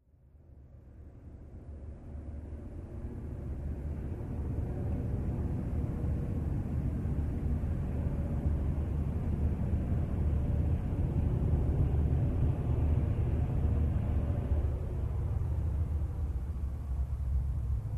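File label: Medium Distant Train, diesel bys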